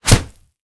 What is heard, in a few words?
elbow; battle